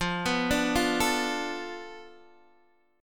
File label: F 6th Flat 5th F down Guitar DADGAD, chord